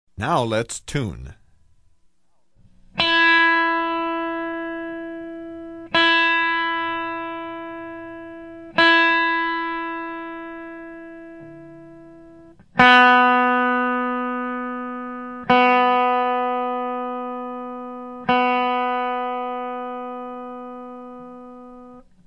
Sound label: Tuning Notes Heavy Metal Lead Guitar; Troy Stetina